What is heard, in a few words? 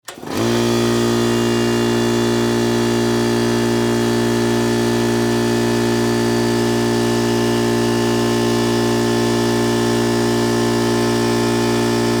Industry Air Compressor On Run Off; Air Pumps (Sound Effects:Machines)